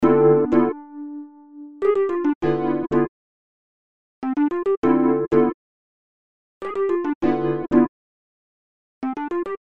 e piano loops

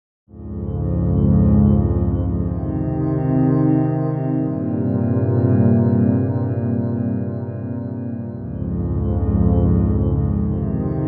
Spacey dream 60bpm Atmosphere Lo

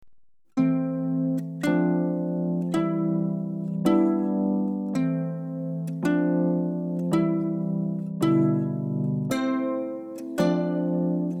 Fanfare for Uke

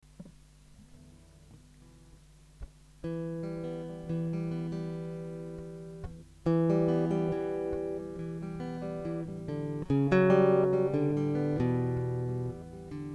Em 140 renaissance guitar impro

